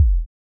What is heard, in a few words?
kick; Mistergenerator